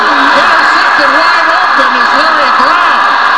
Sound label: Default Beep; Cowboys